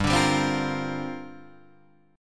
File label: G Minor 7th Add 11th; Gm7add11 strummed; JGuitar